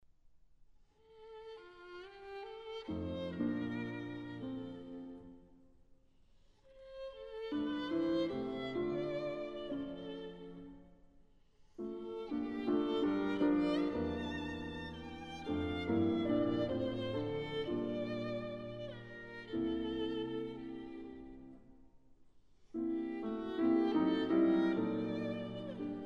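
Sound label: violin sonata; stenhammar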